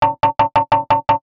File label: cartoon knock on window or tv screen